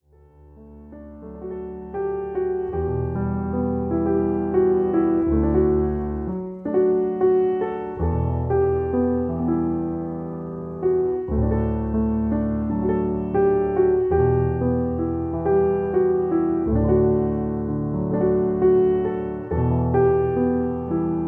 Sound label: Meditations on Acoustic Piano